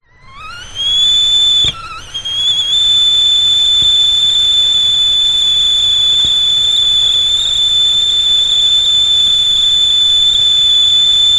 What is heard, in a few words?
household kettle boil whistle old fashioned